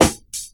old school sparkly snare one shot d sharp key; Duration 0:01

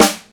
juicy acoustic snare g key

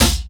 clean snare c key

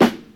dark snare drum a sharp key; Duration 0:01; Channels Mono

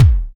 deep kick drum f sharp key; kick drum samples

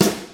sharp acoustic snare sample f key; snare drum samples

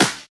perfect snare a sharp key; snare drum samples

